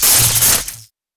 respin Transform; netent

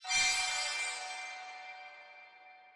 logo Out; netent

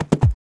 reel bounce; netent

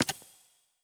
reel Bounce; netent

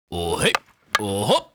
red panda ground pound; netent

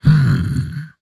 map more search; netent

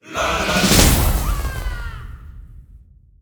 big win start; netent

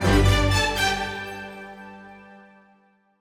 small win; netent

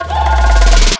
big win ramp up; netent

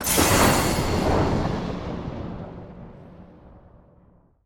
free Spin Picker Mode Smash in; netent

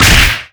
Hit Heavy Forest; netent

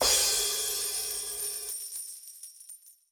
near win Win; netent

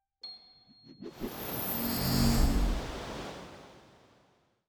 wild spread start; netent

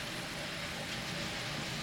reel Spin; netent

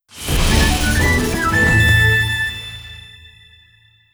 large Win Musical; netent

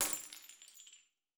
beat break glass; netent

